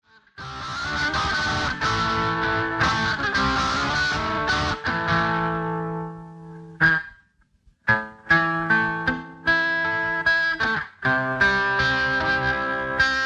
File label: Rock n Roll Double Dub ballad